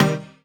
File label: Synth Shot C